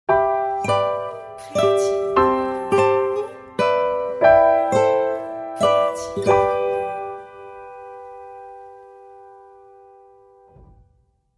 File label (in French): piano guitare espagnole